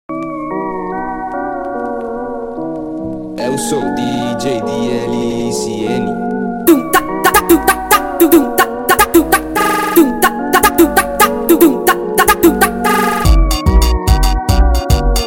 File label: Funk sped Up